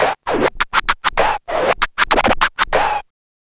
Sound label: scratch kit, vinyl